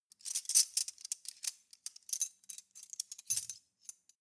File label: cutlery teaspoons handle long